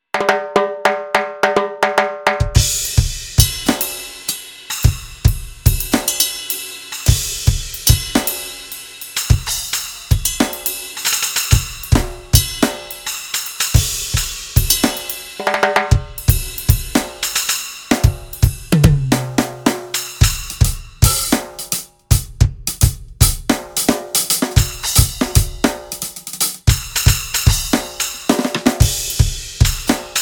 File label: gewa G5 Raggae Kit